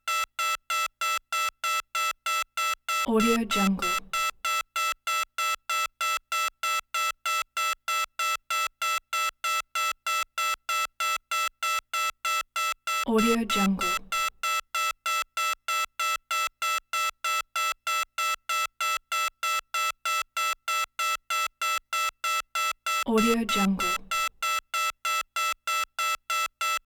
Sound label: computer alarm rapid rizzy beeps constant